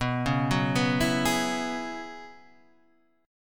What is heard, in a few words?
Gb 9th Suspended 4th B, chord